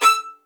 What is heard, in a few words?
XLD2 E6, LOUD VIOLINS; Q Up Arts; Denny Jaeger Master Violin Library